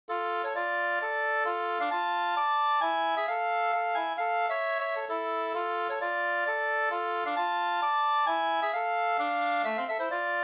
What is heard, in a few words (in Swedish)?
polska Alvdalspolska; e Simon Simonsson Da 2st